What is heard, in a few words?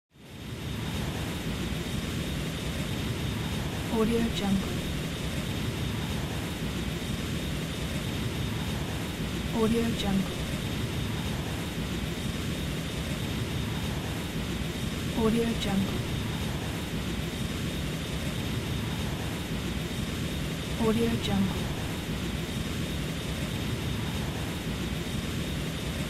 jet plane flies